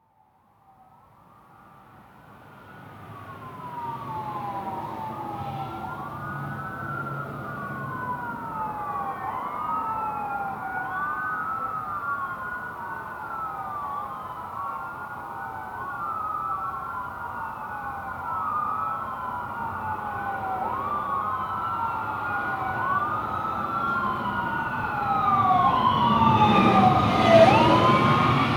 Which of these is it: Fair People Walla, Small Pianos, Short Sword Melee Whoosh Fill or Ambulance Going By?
Ambulance Going By